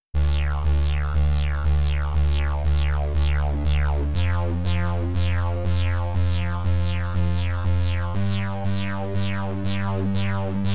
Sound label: Synth Bass; ElectroLash